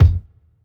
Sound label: small reverb kickdrum f sharp key; kick drum samples